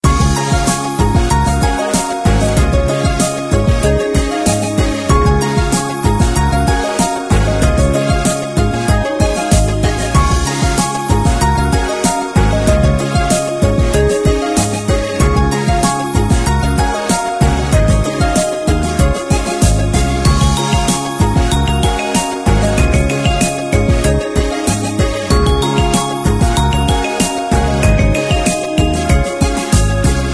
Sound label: Bonus; Chalk Dash Carnival